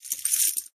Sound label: chips win; European Roulette; Evoplay